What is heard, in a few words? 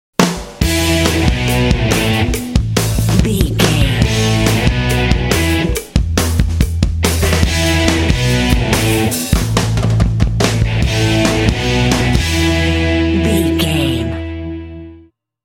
pumpin indie alternative rock energy bumper